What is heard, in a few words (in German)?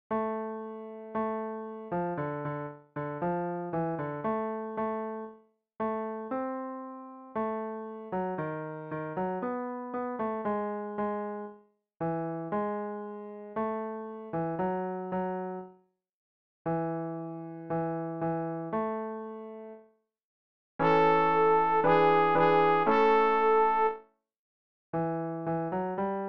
alt rezitativ und chor; Christian Heinrich Rinck: Weihnachtskantate